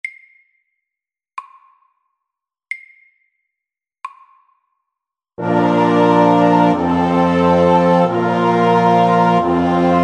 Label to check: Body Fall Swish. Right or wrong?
Wrong